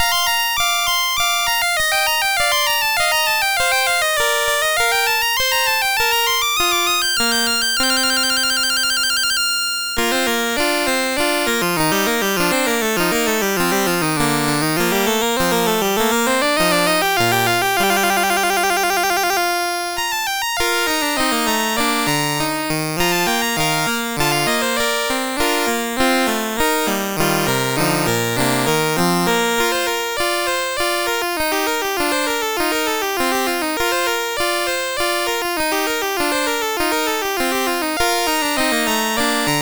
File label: Keyboard Piece, MOS6581R3